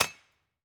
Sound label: mine stone